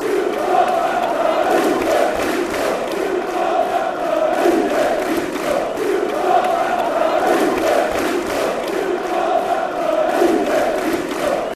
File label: chants; stuart mccalls bradford army